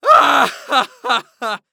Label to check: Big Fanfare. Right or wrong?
Wrong